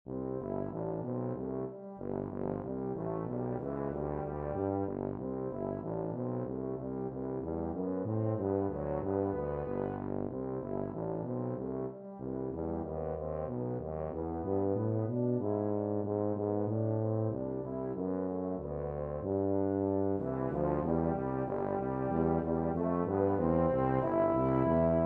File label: EU TBA, tuba duet